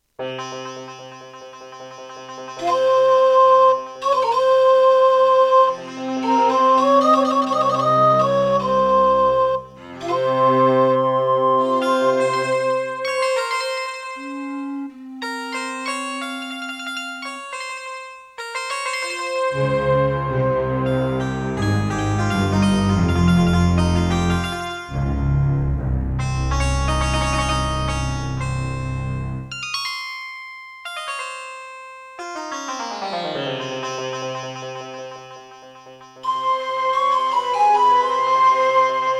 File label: Roland SD-50 GSMODE; Prince of Persia 2 - Intro